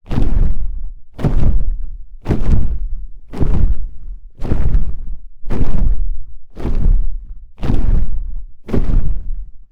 DRAGON, Mythical creature, Extra Large, deep, large, sustained flight, fast; Wings; Bonson